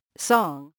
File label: song